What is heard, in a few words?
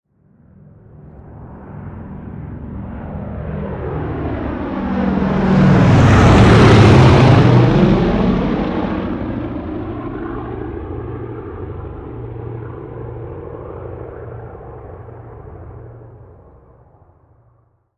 Prop B17 Pass By